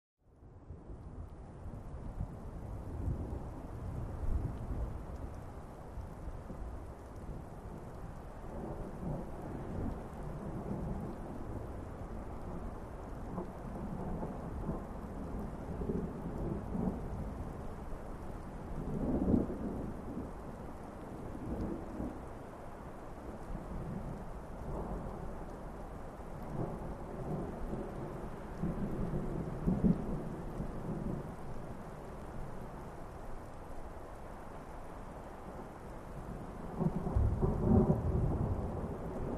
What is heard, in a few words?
Thunder Mountain, distant